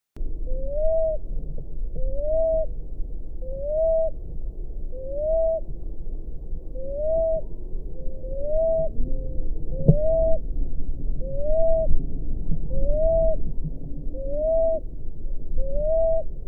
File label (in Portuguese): canto do rolinha cinzenta